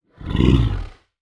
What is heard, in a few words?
Horror Dragon Breathe